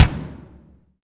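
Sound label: bass drum, Roland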